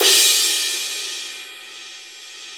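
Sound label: cymbal crash; Sound & Vision - Gigapack I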